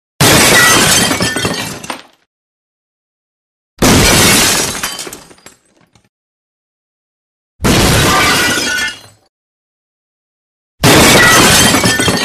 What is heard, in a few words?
Glass Smashes Heavy Glass Impact & Crash; Hollywood Edge Foley Sound Library